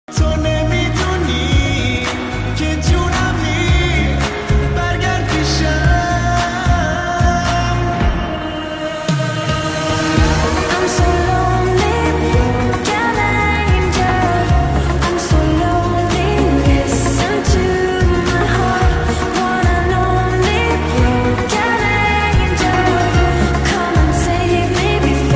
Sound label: phone ringtone song tone